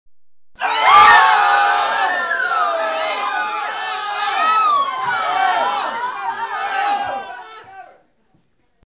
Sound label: scream